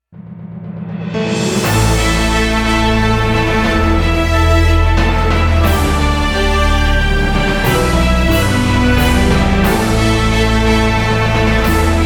Non Fighting Scenes

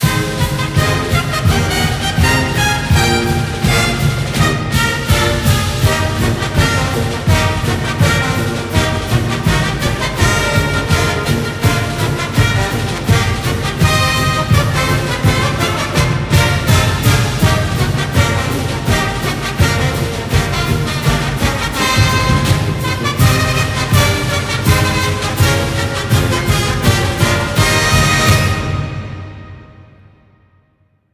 Broadcast Fight Song

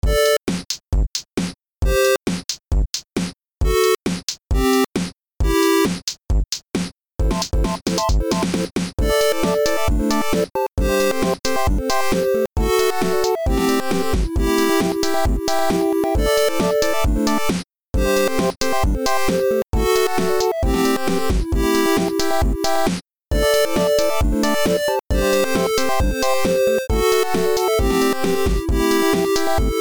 8bit loop beat; Tyke & Sons Lumber Co

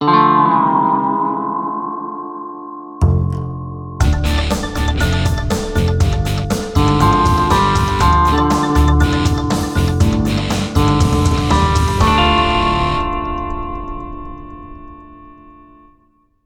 Quest Stinger; Buried

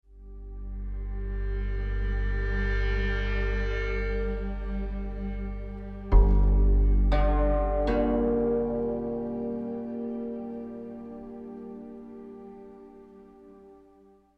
Tension Chaos End; the last of us

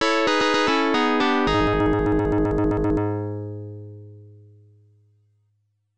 Start Music; Dragon Buster; Fujitsu FM77AV

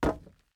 wood land

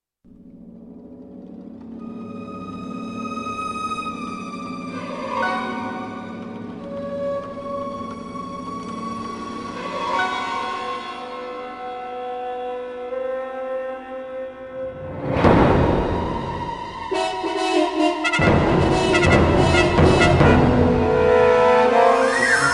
Horror; Kid Pix 3 Deluxe; windows gamerip 2000

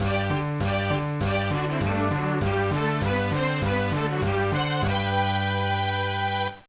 Jingle; Tanbi Musou Meine Liebe; GBA

